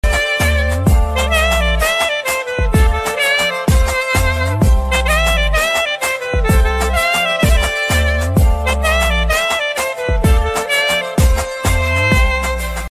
instrumental ringtone, love instrumental ringtone, instrumental